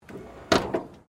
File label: CAR MAZDA RX7 SERIES3 EXTERIOR LIGHTS DOWN; 1983; Cars; Vehicles